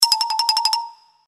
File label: Alert